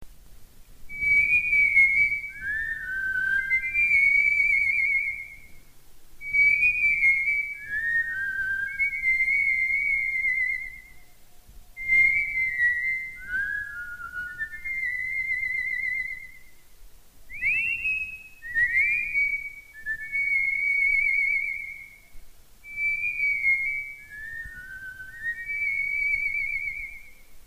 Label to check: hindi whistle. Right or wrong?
Right